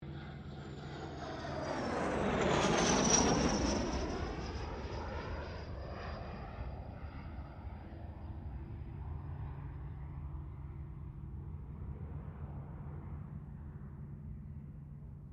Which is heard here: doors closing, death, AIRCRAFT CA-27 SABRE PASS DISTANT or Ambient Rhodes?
AIRCRAFT CA-27 SABRE PASS DISTANT